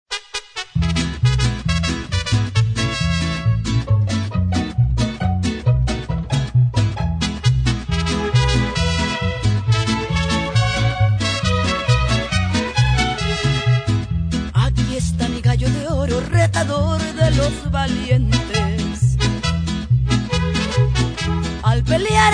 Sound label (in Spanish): Corrido; Emblemata; Musica; spagnolo